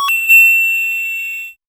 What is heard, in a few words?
coins collecting game sou, lofi